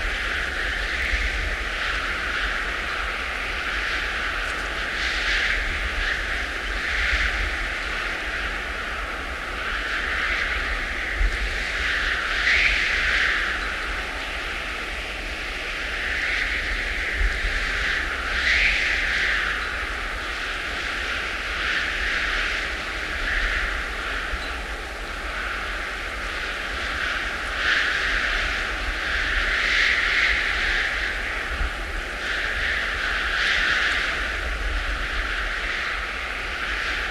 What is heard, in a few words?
rattler; wildland